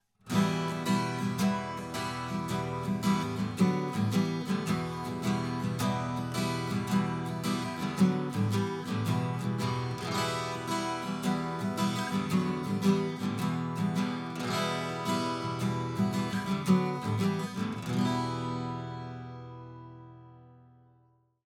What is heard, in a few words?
Acoustic strumming with LP engaged; neumann u 87 ai